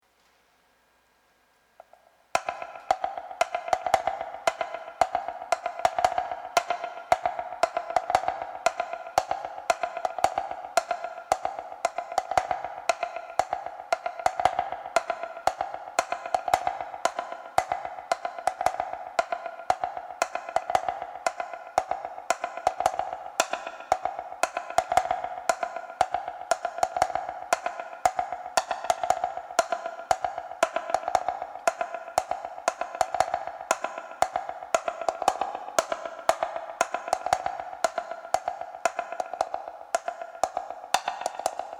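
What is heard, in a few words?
percussion test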